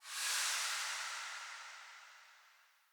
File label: Shrine Activate